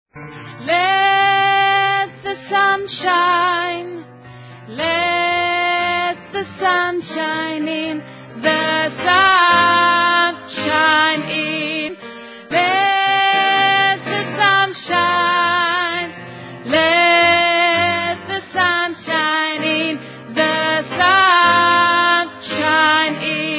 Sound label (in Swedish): alt, stammor